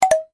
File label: stop sim; Food Farmer